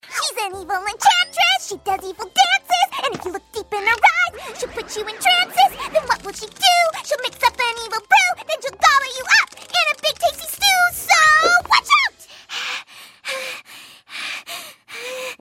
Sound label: Acapella